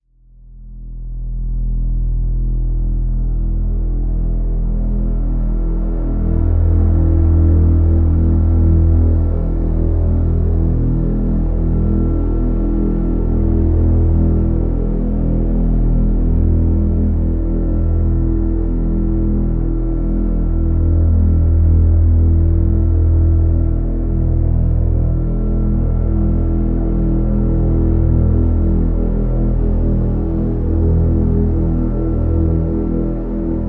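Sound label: drone; Interrogation E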